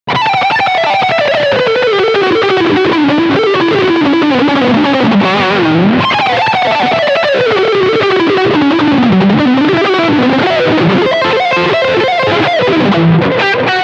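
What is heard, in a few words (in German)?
Lead Shred Mittenposition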